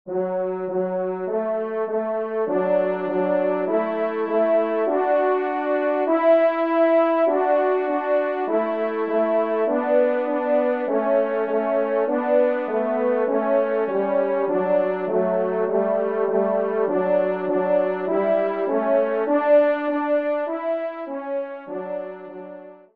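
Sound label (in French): duo, ensemble, trompe cors; Kling; duo cors n 19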